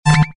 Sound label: beep single electronic blip